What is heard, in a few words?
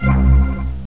items